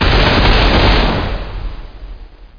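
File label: weapon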